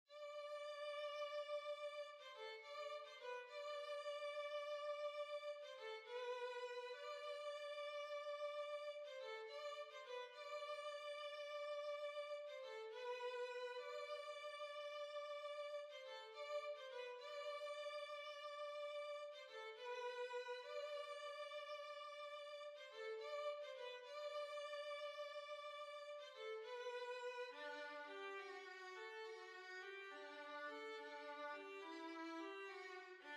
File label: Violin